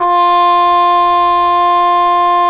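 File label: brass cup mute trumpet, dry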